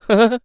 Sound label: laugh; Eternal Return; ENG